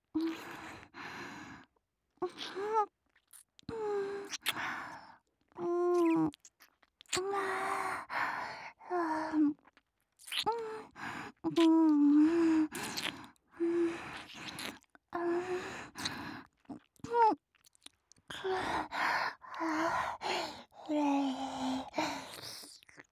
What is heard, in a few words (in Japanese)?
キス; 【マゾハーレム】